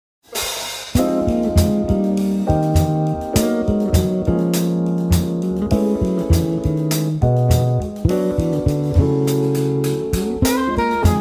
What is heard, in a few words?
Jazz Quartet